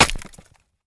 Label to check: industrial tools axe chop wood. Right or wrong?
Right